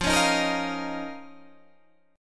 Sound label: Eb 7th Suspended 2nd, F